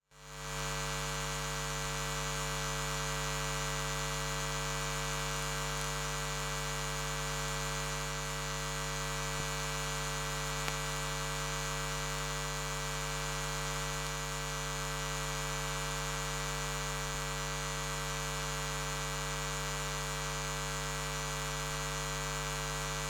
NOISE EMF Radiation, Constant Hum, LCD TV Screen Off; Articulated Sounds; Electromagnetic Household Fields